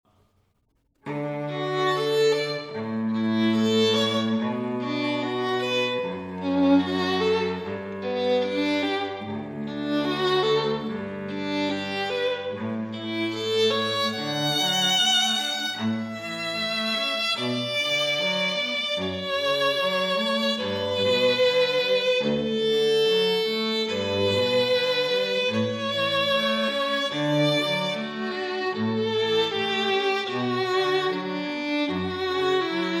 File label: Cello Violin; Canon; Pachelbel